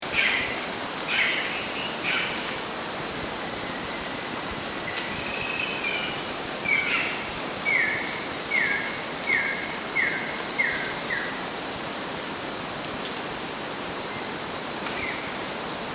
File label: spotted kingfisher